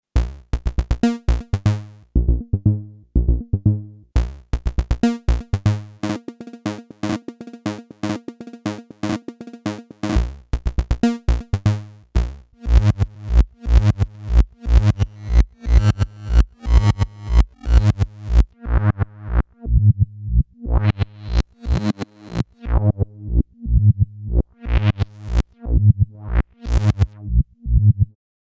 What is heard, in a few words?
Scooper Dark Energy Loops Filter